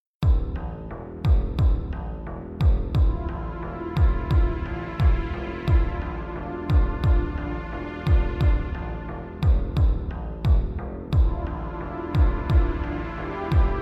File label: Thunderclouds of Magical Power, Ambient ver.